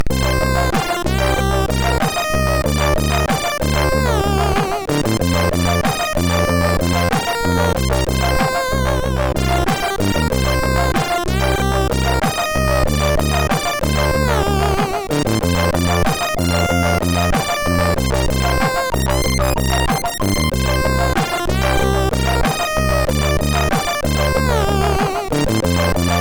Pheric, MOS6581R4

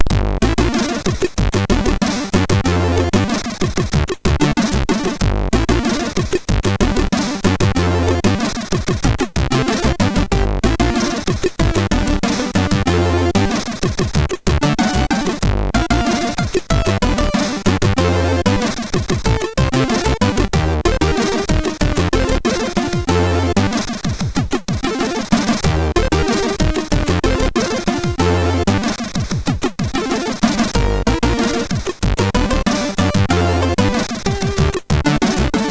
wild bass, MOS6581R3

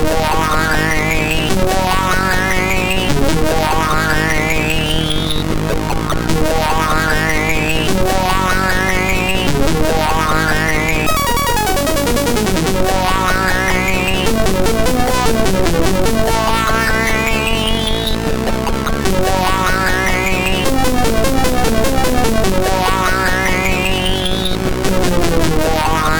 Pure Alarm, CSG8580R5

